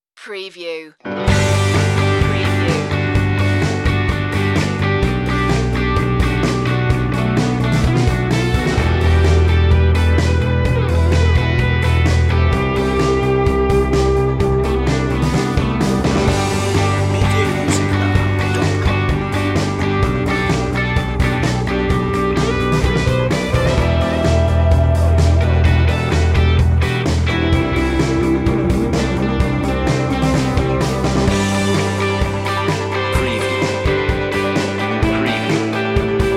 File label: positive drive